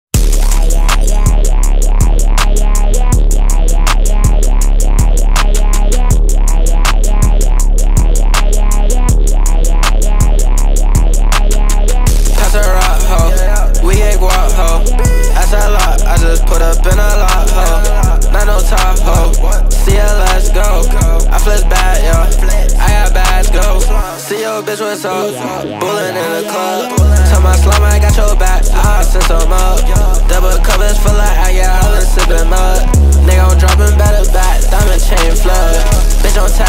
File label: Pop